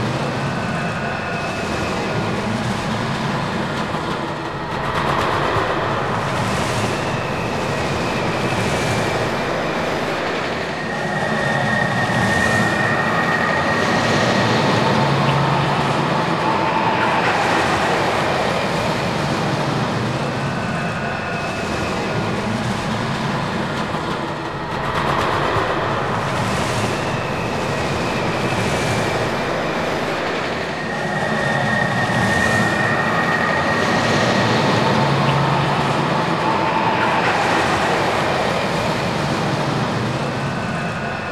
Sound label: Ambience Mole Train; donkey kong country returns 3ds wii wii u 2010